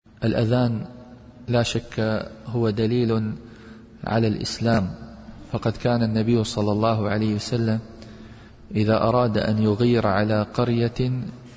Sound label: azan; dalil islam